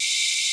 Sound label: VOICE NOISE, SYNTH VOICES; Music Store - Claudius Diemeter Schwerlos